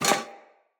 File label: Foley Armour Fill G; Enemy; Mockeries